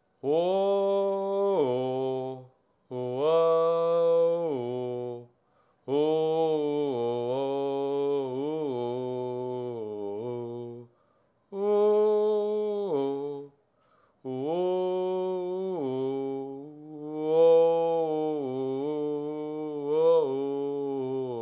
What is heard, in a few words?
Seth sing; Hollow Knight: Silksong